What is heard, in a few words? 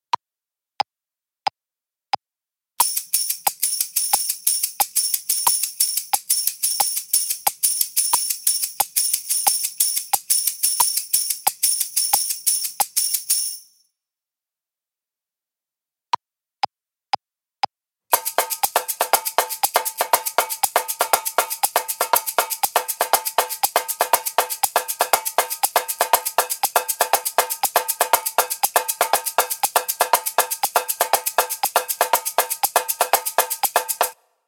Shaker Tambourine